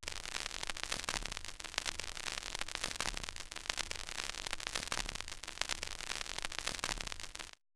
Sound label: vinyl noise, Roland MC-909